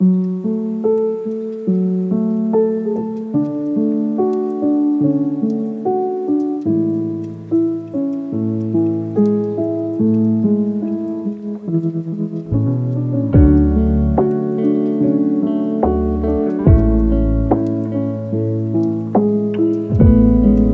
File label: lofi hip hop